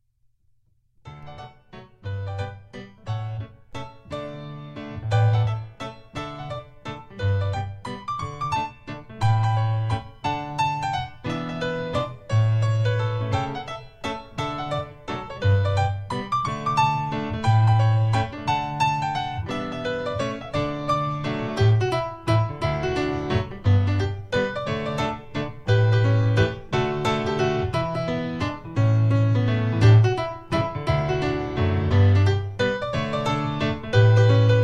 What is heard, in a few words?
mazurka; Coppelia